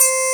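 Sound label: strat sustain C5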